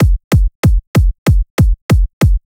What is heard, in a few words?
Kick F